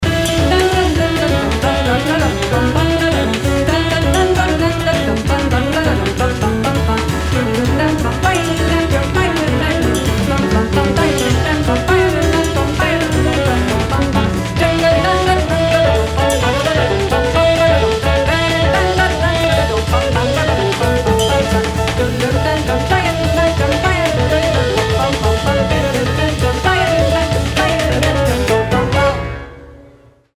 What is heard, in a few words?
Polka